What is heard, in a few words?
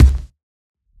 Kick, Dance Drum Kit; 99Sounds x Monosounds; Twilight